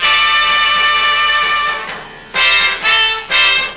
horns, jazz loops

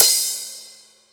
whispy, cymbals

